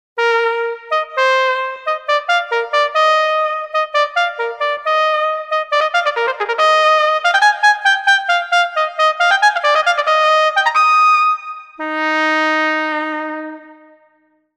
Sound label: Class Trumpet